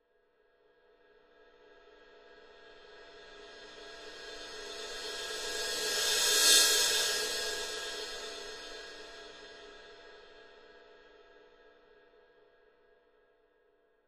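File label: Cymbal Thin Crescendo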